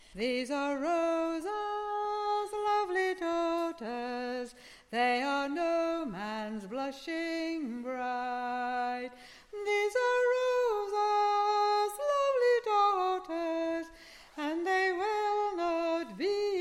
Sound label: Chorus Sop